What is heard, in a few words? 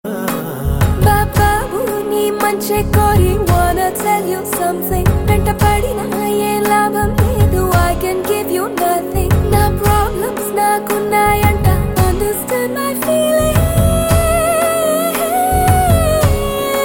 Telugu Ringtone